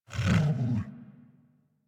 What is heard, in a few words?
interact sleep wake, Kubrow; Enemies; Warframe; Tame